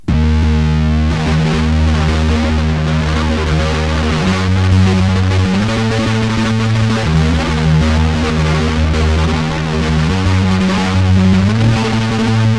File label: guitar